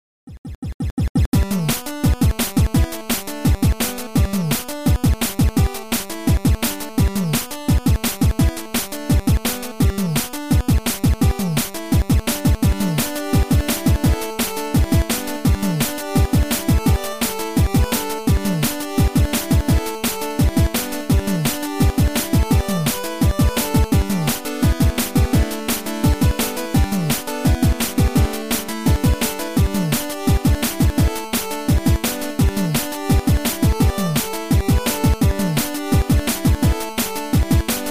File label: Square Wave Dance